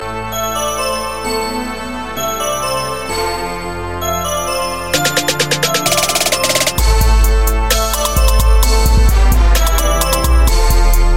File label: Hip-hop